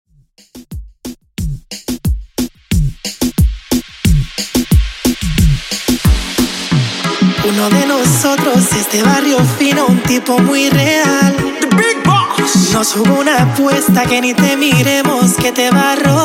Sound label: Reggaeton, 90Bpm